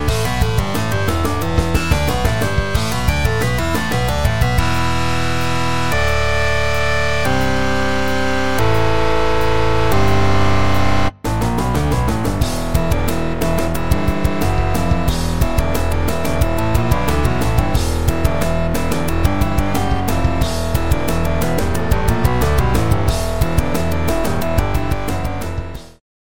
metalized rock organ

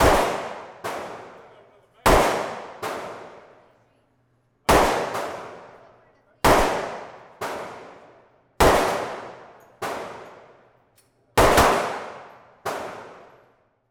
45 hand guns shoot out

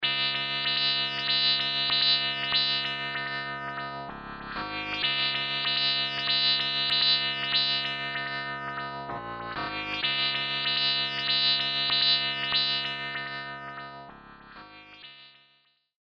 multilfo synth

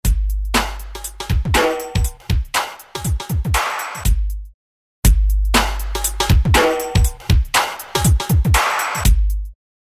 loop

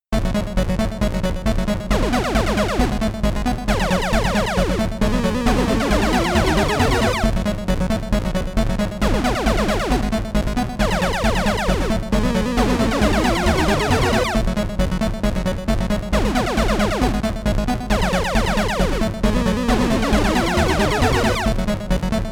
chiptune kit

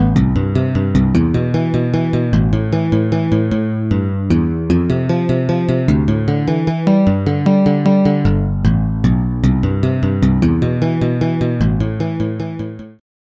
bass smooth bass

